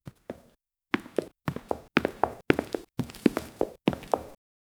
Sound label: footsteps with no echo